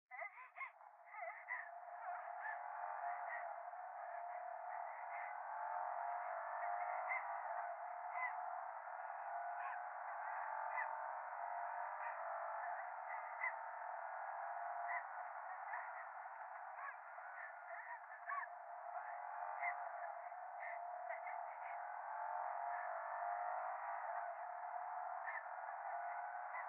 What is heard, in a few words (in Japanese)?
喘ぎ 車の中